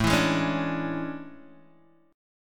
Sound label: A Major 11th, down Guitar Open G